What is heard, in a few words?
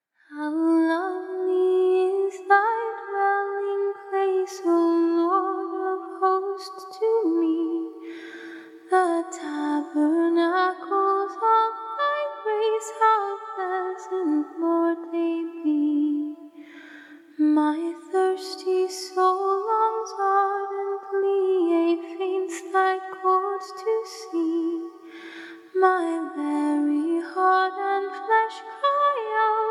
acapella hymns